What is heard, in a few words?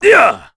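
Vox Attack, Korean; Bernheim; kingsraid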